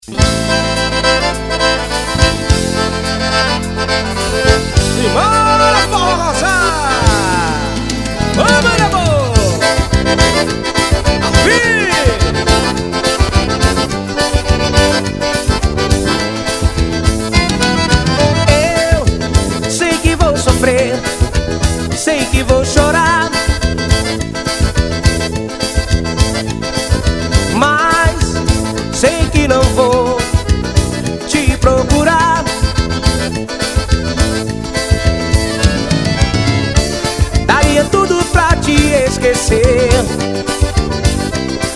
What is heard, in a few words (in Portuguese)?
forro rocado ao vivo